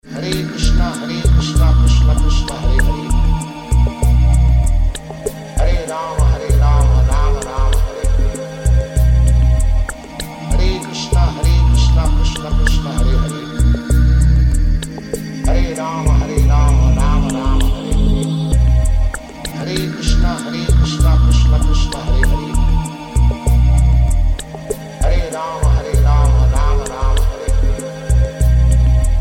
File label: maha mantra lounge; prabhupada